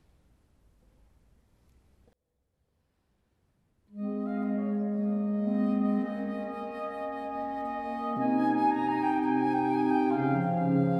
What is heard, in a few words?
oboe